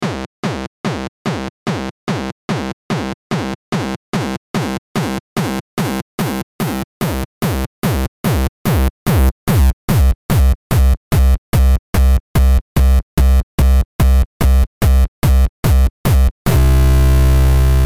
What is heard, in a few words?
Kick Maker; Metalthrix; QiTaNo; DK